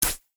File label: Gameplay tap Miss; bansonic; BANSONIC SFX